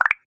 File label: new message sound